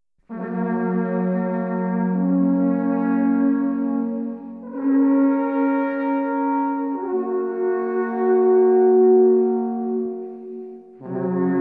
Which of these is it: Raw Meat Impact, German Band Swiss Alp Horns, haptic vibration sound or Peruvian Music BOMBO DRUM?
German Band Swiss Alp Horns